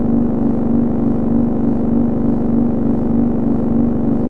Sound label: Pickups Powerups